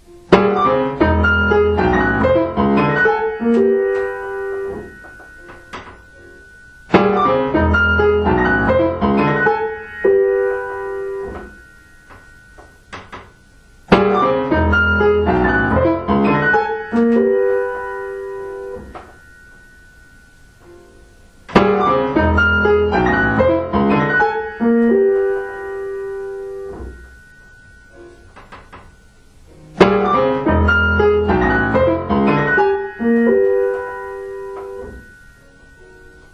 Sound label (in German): Klavierstück tempo calibration; Klavierstück I Version A Crux I